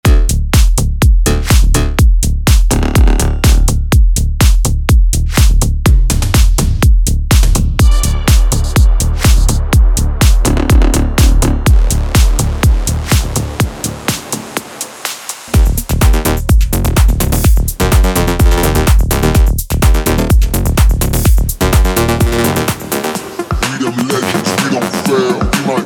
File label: Indie Dance; Production Music Live; Shift